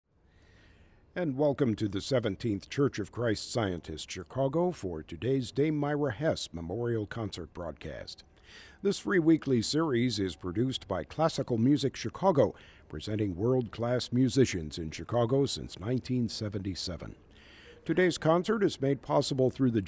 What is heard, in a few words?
Piano; wfmt; Dame Myra Hess